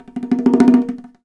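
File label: amb djembe, ambient percs; Univers Sons; Basicussions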